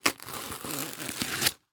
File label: Latex Gloves Box; Medical; Blastwave